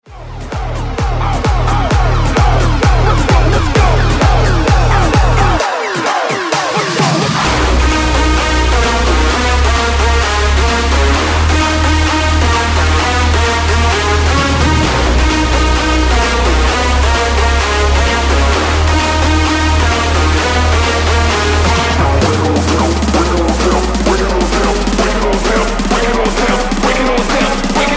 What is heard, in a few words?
break mix; DJDOLLS; remixplanet